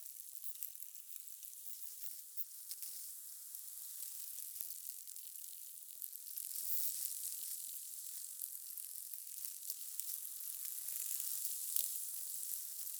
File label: Infested Eris Exterior Wind Debris Insect Loop; Warframe; Ambience; Amb Loops Exterior